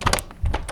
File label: door open